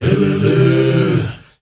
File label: MALE HUM; Spectrasonics; Heart of Africa